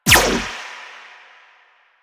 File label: Choir Erupt Firing Eye; Warframe